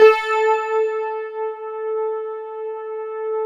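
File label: GLASS Rhodes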